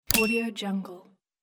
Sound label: graphic popup